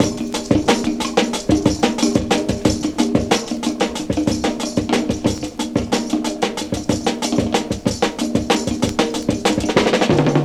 groove, Drum loops

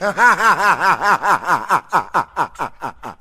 laugh demon

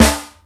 snare, Real

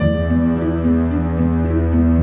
strings bumps, Sad; Loops

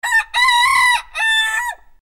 chicken rooster crow; Animals

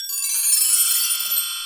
chimes; Misc Percussion

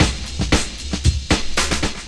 Drum loops, Rolls

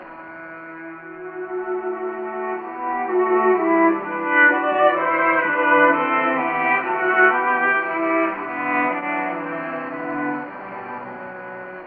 strings, Sad Loops

